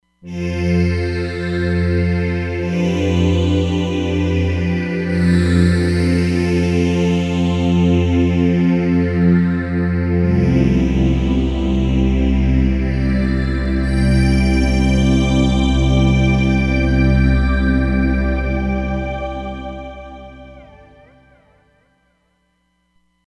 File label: Phaser Pad